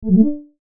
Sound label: digit